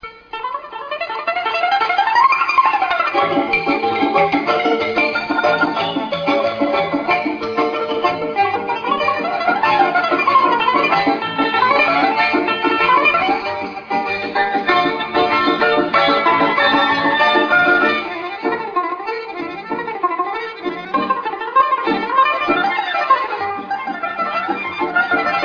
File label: Russia band